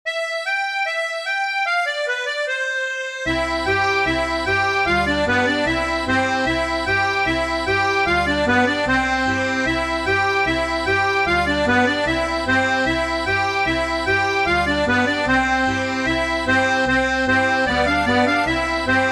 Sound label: polka piquee; arr rode boek